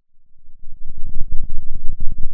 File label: Sound of a thunderstorm, center